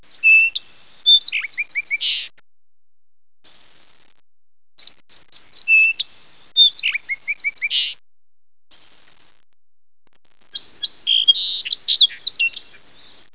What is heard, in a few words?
song sparrow, songs